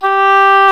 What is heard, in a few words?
Oboe; Oboe f; Roland L-CDX-03 Disk 1; Oboe 4-8